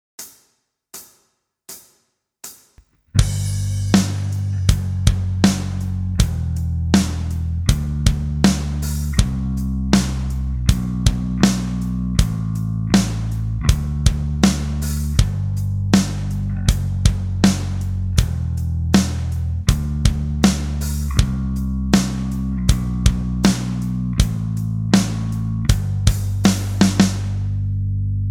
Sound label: Backing Track